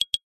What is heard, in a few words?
multimedia notification glassy click pop up etc